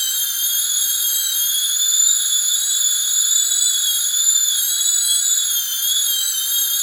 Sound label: SWEEP HI, Yamaha VP-1, SWEEPER; Music Store